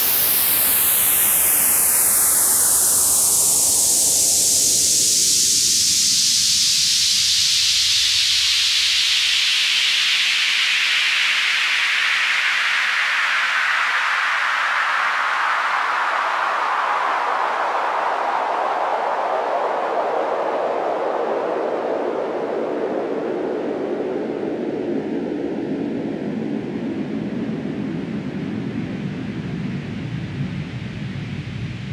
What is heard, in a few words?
Sweeps Down